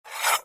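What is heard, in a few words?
short ringing sharp sharpening sound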